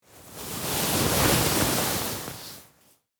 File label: Large full bag dragging noise; foley